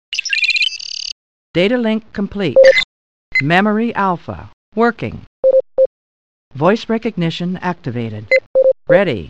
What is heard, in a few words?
startup; Star Trek